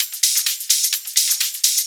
Hihat Loop 128 BPM

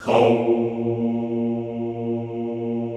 HOUUU A#1, Extended Classical Choir; Best Service

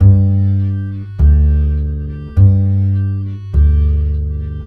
MEH2 BASS

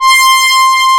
MOOG; E-MU Formula 4000 Series Vol. 3 – Analog Odyssey; Moog Synths

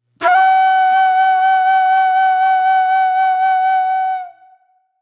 Mellow Flute

